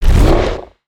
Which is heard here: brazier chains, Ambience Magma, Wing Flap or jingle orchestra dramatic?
Wing Flap